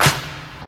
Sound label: 80s reverb pop clap D key